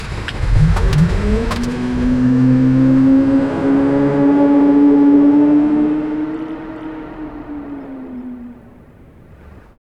sound effect, flying car

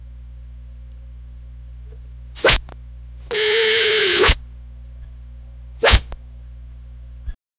camera shake gasp

